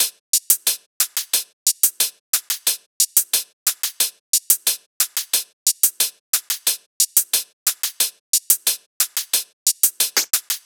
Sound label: Hat Loop, 90; Drums; Hi Hat Loops; Hydra; Ghosthack; Drum Mega Bundle Demo; Drum Hero 5